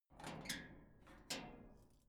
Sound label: metal gate latch